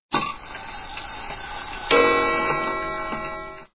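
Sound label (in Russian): zavodyat chasi, winding a clock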